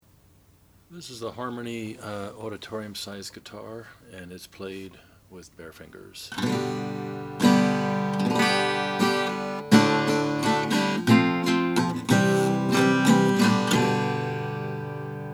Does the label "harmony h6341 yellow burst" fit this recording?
Yes